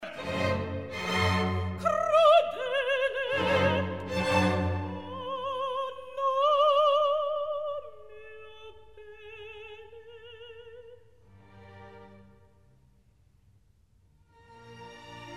Recitativo ed Aria; Crudele Ah no mio bene; Mozart, Wolfang Amadeus; Opéra - Don Giovanni

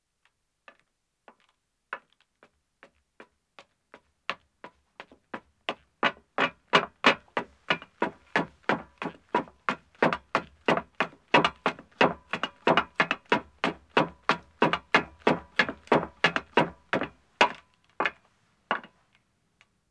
Metal Stairs Ascending, 1 Man; Footsteps; BBC Sound Effects Library

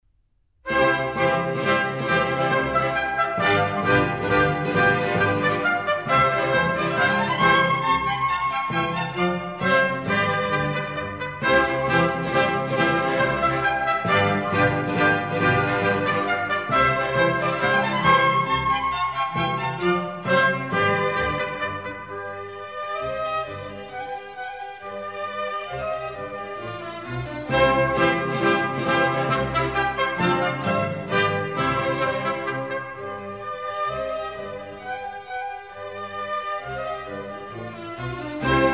Minuets, C major; Mozart; Dances and Marches

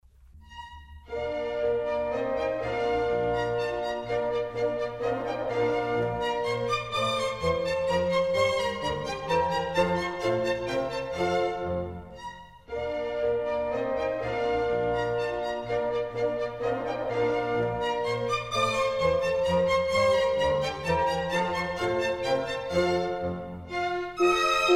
German Dances, Bb major; Mozart; Dances and Marches

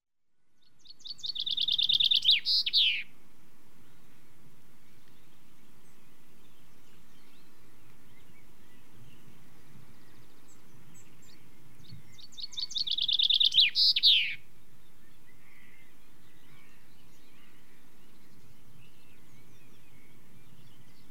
Chaffinch, British Birds; The BBC Sound Effects Library

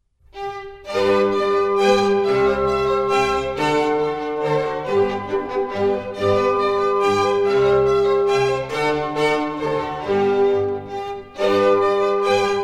Minuets, G Major; Mozart; Dances and Marches

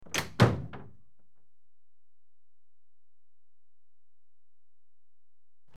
Carriage Doors Closed; BBC Sound Effects Library; Age Of Steam

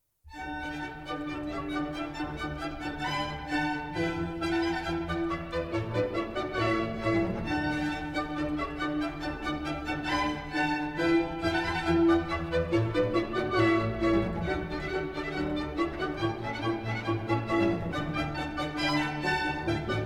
Contredanses, D Major; Mozart; Dances and Marches

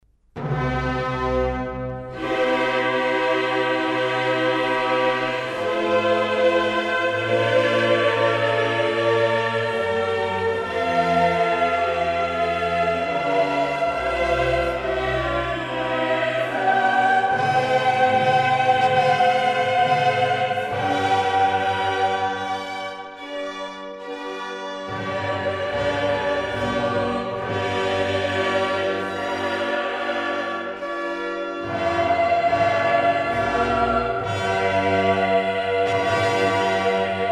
Mass in C min Gloria Jesu Christi; Mozart; Missae and Requiem; Davis, Gardiner; Grosse Messe

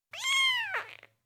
Four Week Old Kitten, Individual, Cats; BBC Sound Effects Library

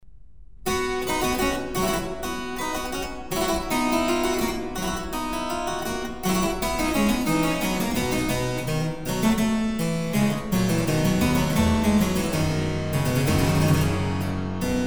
Andante in C KV1a; Mozart

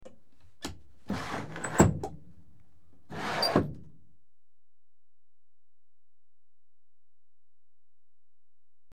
Sliding Windows Open; BBC Sound Effects Library; Age Of Steam

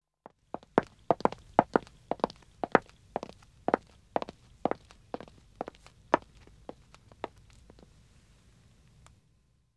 Wood (Dead Acc) Walking 2 wom, Footsteps; The BBC Sound Effects Library

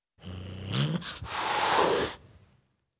Tom Cat Growl And Hiss; Cats; BBC Sound Effects Library

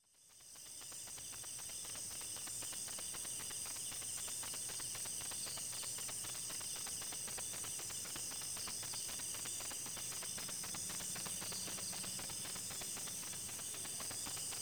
Asian Night, Animals & Birds; The BBC Sound Effects Library

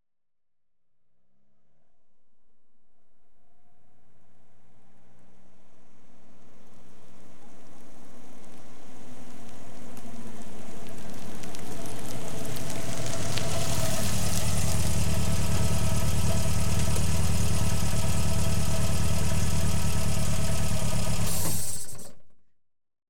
ext- approach from left, Cars; BBC Sound Effects Library

